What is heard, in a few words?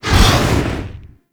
Flame Thrower Stop; Warframe; Weapons